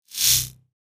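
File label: Electric Crackle, multimedia element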